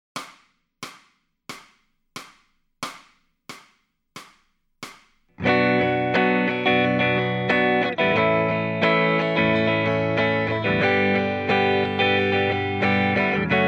Lead Backing Track, electric guitar; Grade 5

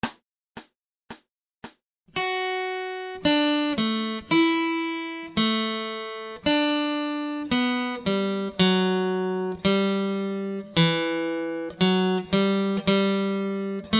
Acoustic Accompaniment, acoustic guitar

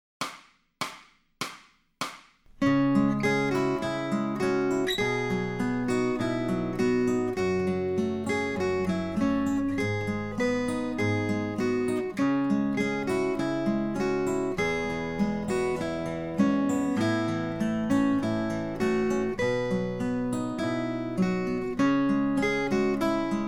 Accompaniment, acoustic guitar; Grade 5

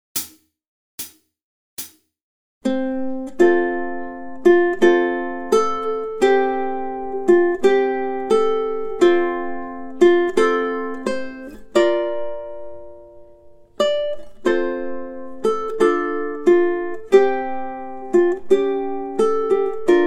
Auld Lang Syne, ukulele; grade4

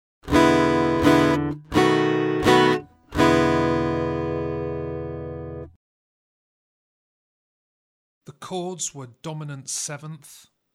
Aural Harmony Test, acoustic guitar; Grade 3

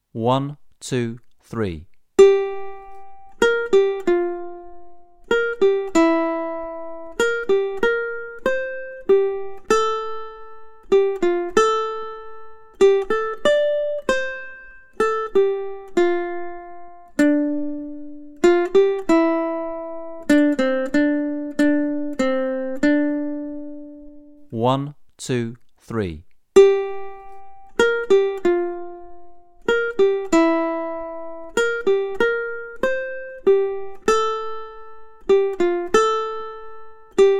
Accompaniment, ukulele; grade4

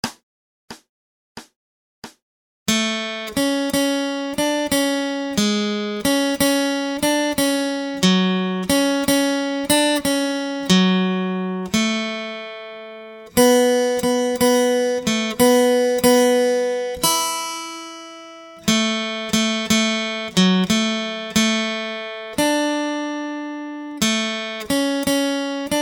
Acoustic Accompaniment, acoustic guitar

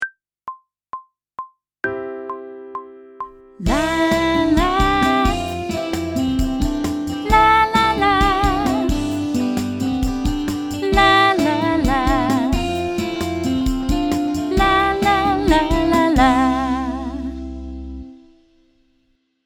Step 1 Technical Work Riffs C major with Vocal; pop vocals

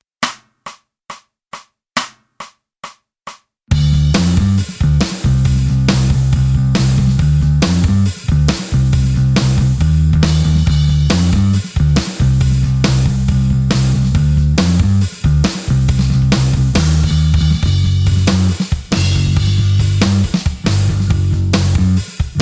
Rhythm Guitar Playing Backing Track; electric guitar; electric guitar performance diploma

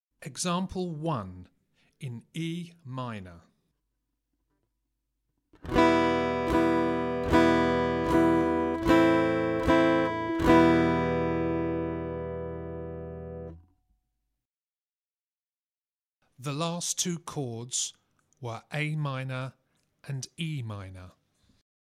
Aural Harmony Test, acoustic guitar; Grade 5

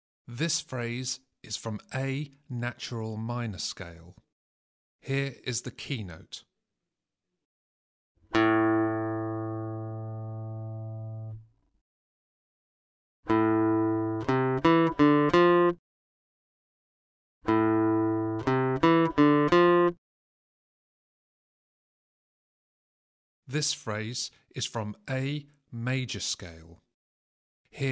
Aural Pitch Test, acoustic guitar; Grade 4

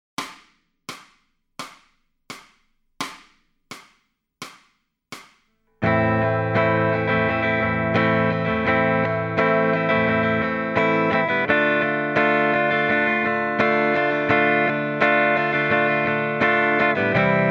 Lead Backing Track, electric guitar

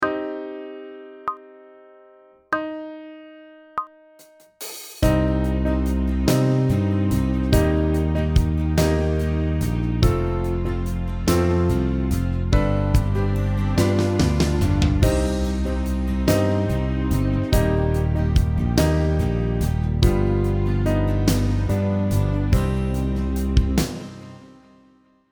Sight Singing backing track, pop vocals; Grade 5

